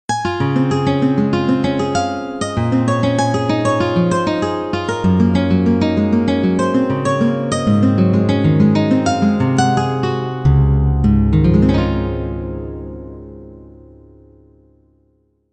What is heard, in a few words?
Nice Piano Music